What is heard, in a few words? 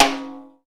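timbale high; East West; Global Sounds; RY30 PERCS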